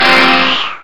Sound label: sfx transition